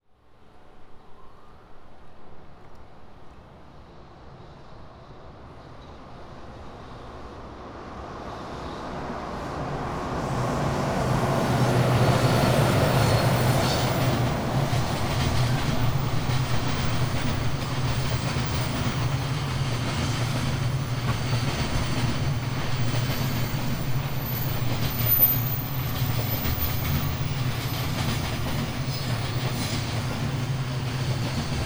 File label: kanayagawa station